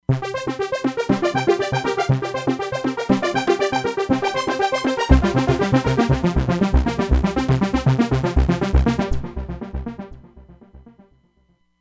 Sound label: Analog Motion, Roland Fantom XR